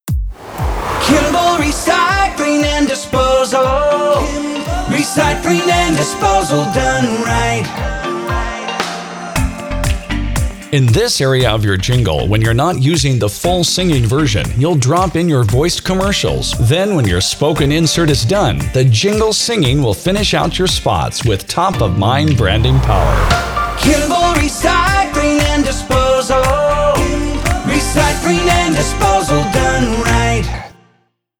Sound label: Recycling Jingle, recycling company jingles; Kimble